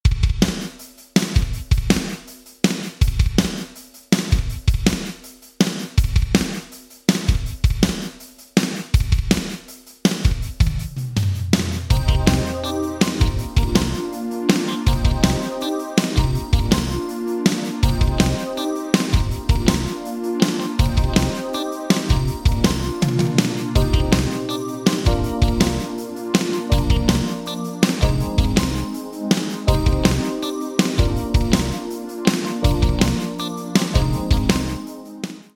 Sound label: Drums Sony MU R201 Gated Reverb; Vintage Digital